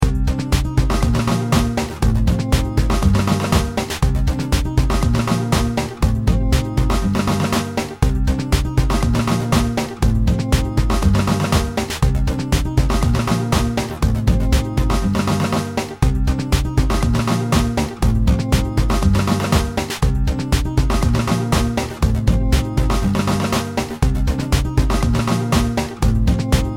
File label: instrumental